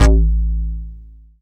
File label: techno, BASS; Techno Trance Essentials